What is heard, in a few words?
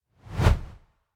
Short Whoosh